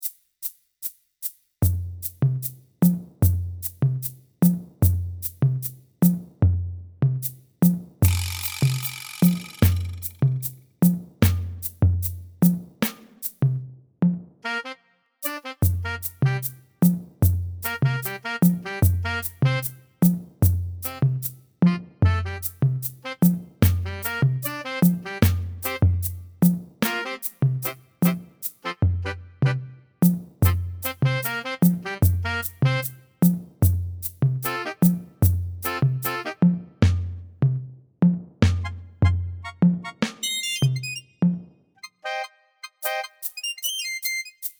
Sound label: bass line; Grooving to the bass